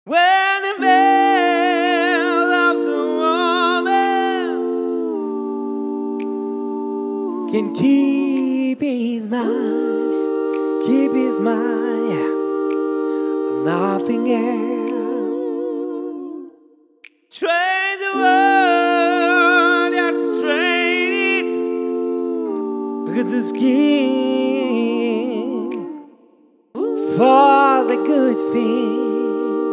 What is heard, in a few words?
acappella, gospel